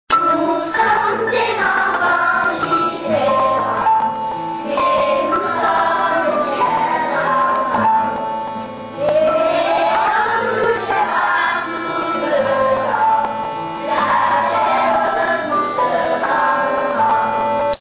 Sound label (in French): chant